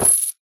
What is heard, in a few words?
equip chain, armor; item; Minecraft; Votive World